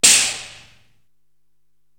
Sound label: SD Air Explosion, Korg TR-Rack